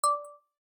Timer

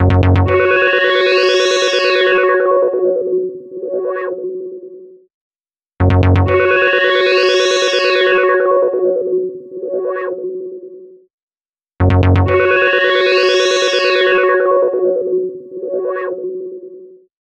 Modular synth; Sony Ericsson; W995 Alt

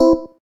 gayageum, keytone

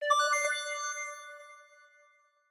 priority notification; Razer Phone

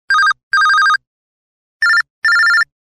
Simple Beep

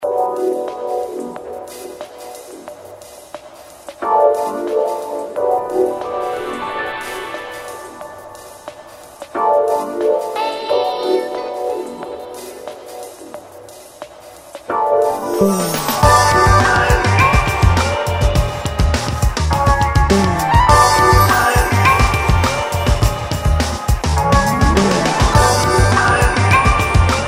Ringtone, Samsung GT-C3110

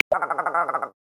Platypus, Australia; Xiaomi Redmi-Note-8-Pro

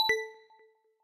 new particle lock; LG X Screen

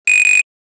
Buzzer, Ringtones; Nokia; 2720

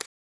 continuous shot; LG Fortune M153 Cricket

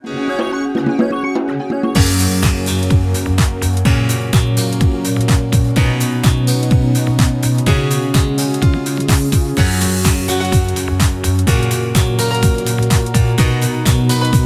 Victory; Infinix Smart 2 HD

